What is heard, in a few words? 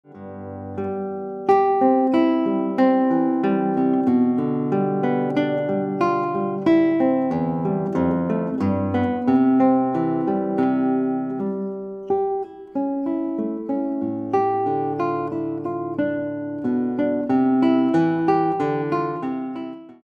Guitar